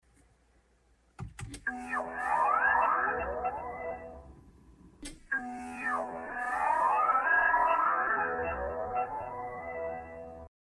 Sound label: mini startup, pedestrian warning noise